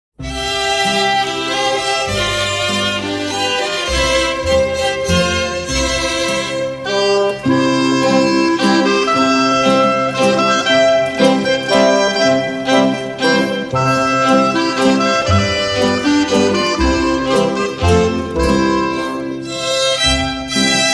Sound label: instrumental